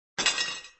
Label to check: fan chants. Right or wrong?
Wrong